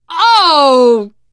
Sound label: reaction disgusted, teen girl, survivor voice; source engine; left 4 dead 2